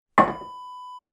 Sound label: water scoop set down